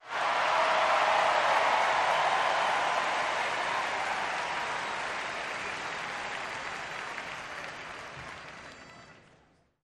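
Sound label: Crowd 50000 Whistle, large int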